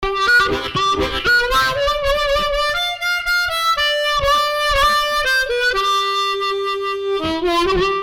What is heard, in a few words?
Harmonica, 120 G